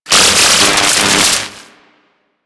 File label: Ocean Electric Surge Large; Ambience; Warframe; Grineer Ocean